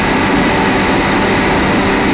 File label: Run, Train; OpenBVE